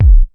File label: Kick, Ensoniq ASR-X Pro